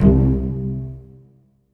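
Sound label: cello pizzicato C1, Virtuoso Strings; ILIO; Kirk Hunter Virtuoso Strings VOL-3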